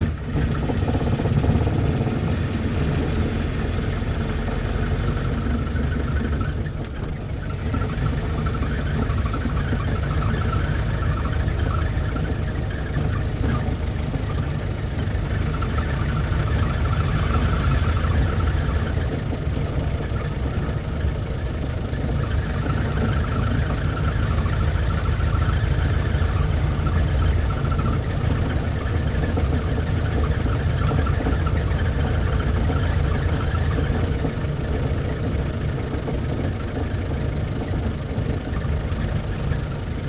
Ford Stake Bed Truck Int Idle With Maneuvers Away, Period Vehicles